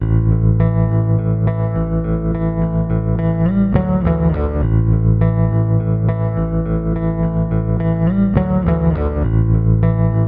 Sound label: Bass Guitar Loops